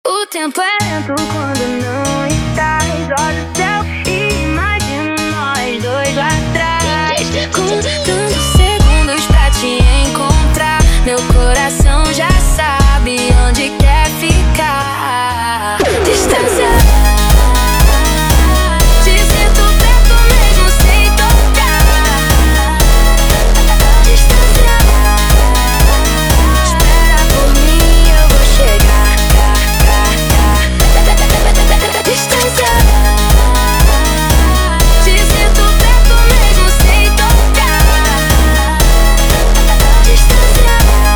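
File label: phonk music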